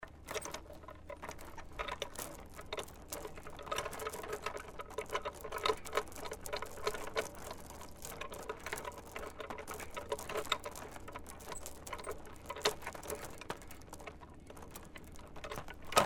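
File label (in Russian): swing with a backrest on chains, shaken by the chains; Звук качелей раскачивающихся на ветру, металлических, на цепях или веревочных, закрепленны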